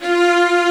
ORCH; akai mpc1000; samples; factorypresetsamples

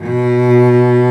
Solo Cello B2; Strings

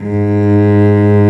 Solo Cello G#2; Strings

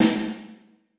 snare ff; Percussion; Sonatina Symphonic Orchestra

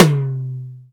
TOML; akai; mpc1000; factorypresetsamples

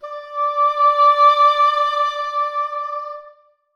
cor anglais d5; Sonatina Symphonic Orchestra

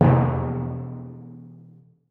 timpani f d#1; Percussion; Sonatina Symphonic Orchestra

